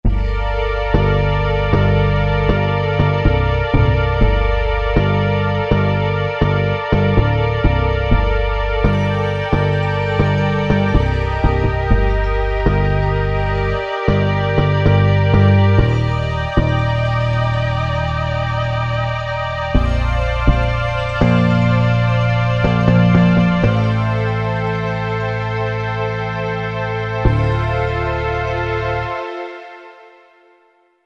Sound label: Bass & Str, Korg MSC-14S; Korg MSC-14S Sound Effects